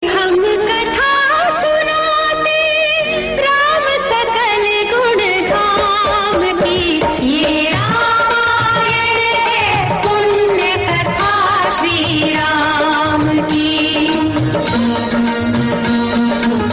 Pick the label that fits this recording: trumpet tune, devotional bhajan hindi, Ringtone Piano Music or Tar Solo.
devotional bhajan hindi